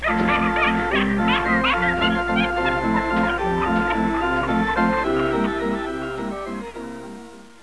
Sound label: laughing